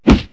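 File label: swing hit; impacts; Wired for Sound Pro CD; Aristosoft, Inc.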